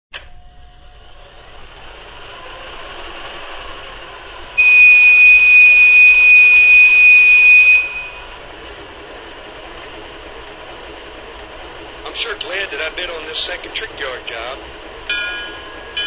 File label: BB1, trains